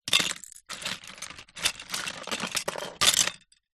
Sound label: toys lego pieces box pile movement